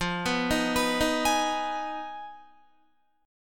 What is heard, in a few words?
F Minor 7th Flat 5th F down Guitar; Fm7b5; arpeggiated